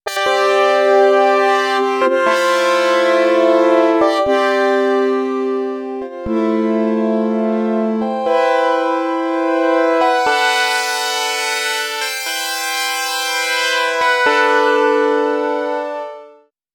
Xpander Fifth Chords; Sonicprojects OP X PRO 3

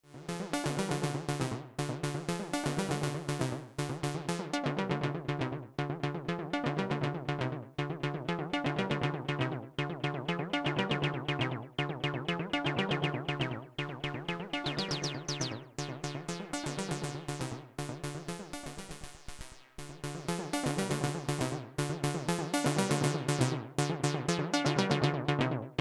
dreadbox Erebus V3 Filter

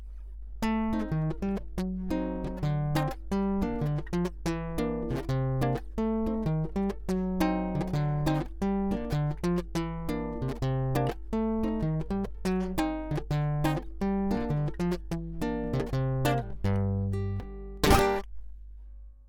Latin Piezo

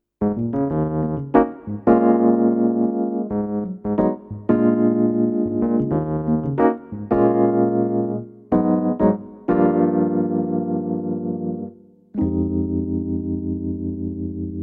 Korg Pa5X E Piano Tremolo

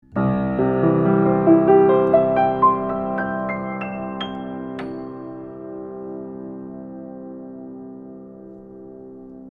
Neumann U67 Mono Piano Cardioid

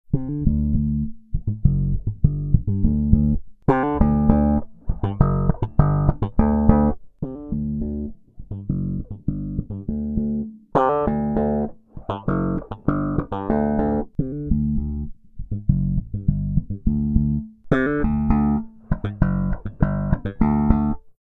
Spector

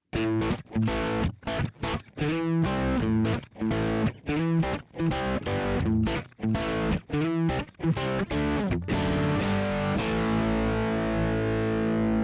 Riff Fingerstyle DI Balaced Out

